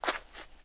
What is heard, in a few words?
step dirt; SPECOPS